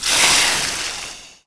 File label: Elem Impact Poison; Defenses; Dungeon Defender; DunDef